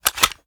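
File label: pistol reload mag out; gmod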